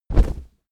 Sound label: step monster step heavy